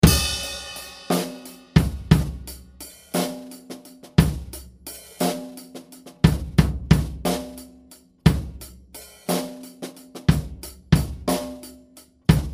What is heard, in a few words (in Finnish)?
6-8 komppi 8 tahtia